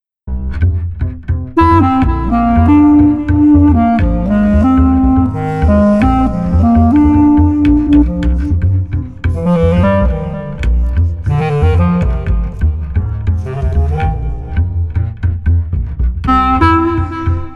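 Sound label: Street Clarinet Bass Latin Groove, 120 bpm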